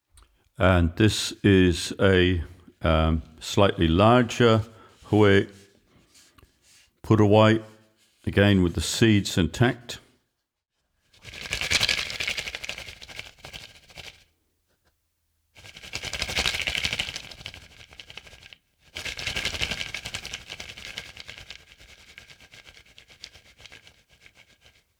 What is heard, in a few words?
Hue Puruwai nui